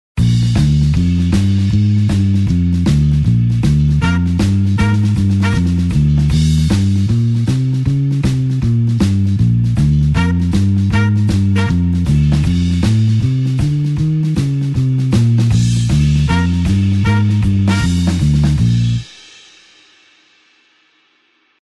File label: blues horns up Bb